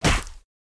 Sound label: Chop Impact; Caffiend; Going Under